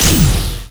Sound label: burst beam